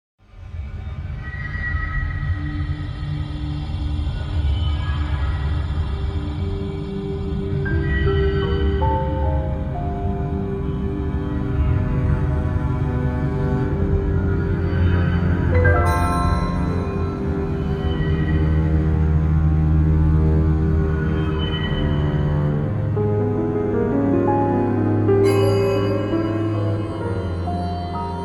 Field BGM; Xenoblade Chronicles 3

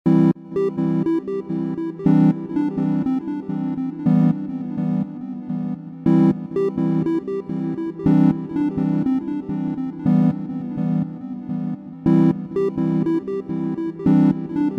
Lose; windows

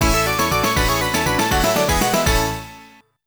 Step Up Winning Clear; tokimeki memorial taisen puzzle dama; konami gx; arcade

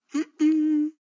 Paper Sad; talking tom cat 2 android ios online gamerip 2011

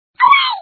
Sound Repel; meeblings gamerip

